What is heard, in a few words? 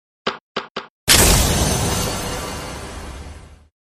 llama splode